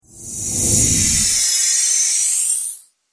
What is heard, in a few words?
Future World Whoosh; Whoosh Sound Effects